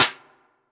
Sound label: Snares, Elektron MachineDrum SPS1 MKII